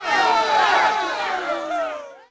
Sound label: CROWD NEG MED; Cars; XBOX